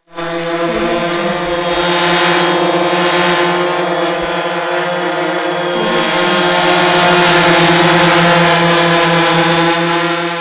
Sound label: flying drone quadrocopter; audiomonkery